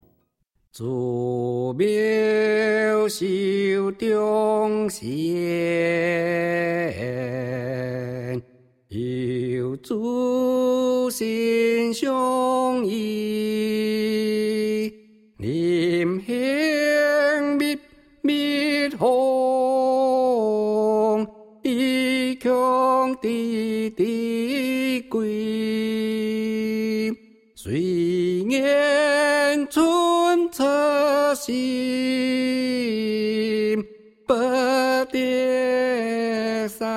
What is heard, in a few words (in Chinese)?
遊子吟 吟誦; 母親節